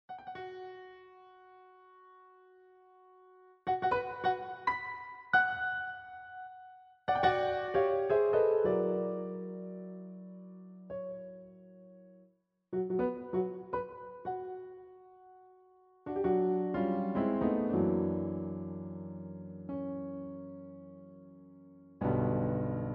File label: piano roll; Caprice Viennois Opus 2; Kriesler; Fritz Kreisler